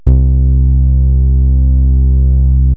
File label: Synths BASS